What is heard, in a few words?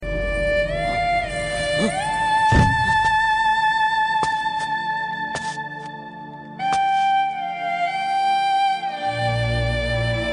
melody love theme, ringtones